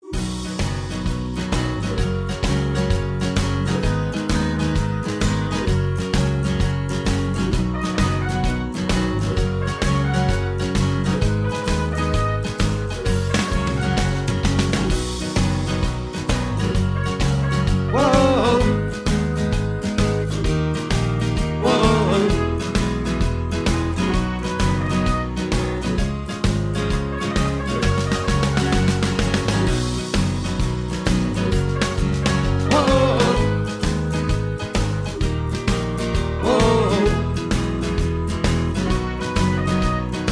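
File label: Instrumental with background vocals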